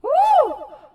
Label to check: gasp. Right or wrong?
Right